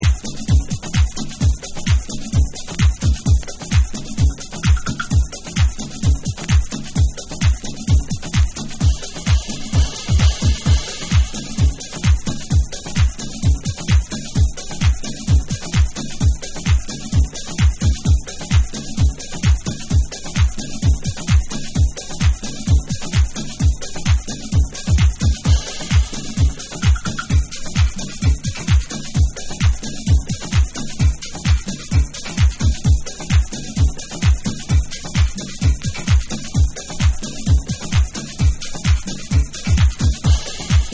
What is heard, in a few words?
Afro Drums Beats